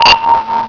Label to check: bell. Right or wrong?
Right